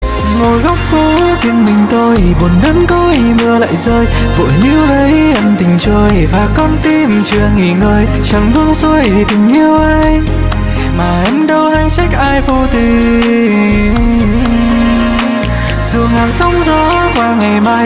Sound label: remix